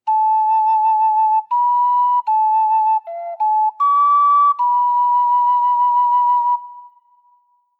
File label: (Ocarina)